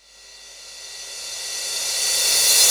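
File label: crash reverse, Dance Mega Drums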